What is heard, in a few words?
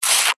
liquid foamy bubbly short fast suck